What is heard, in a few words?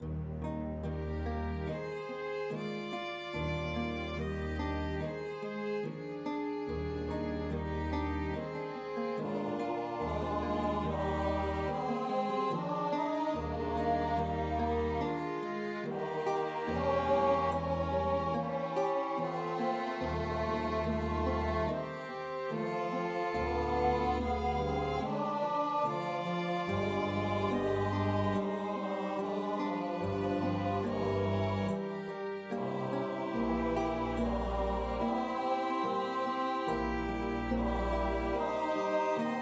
bach betrachte meine seele, bass voice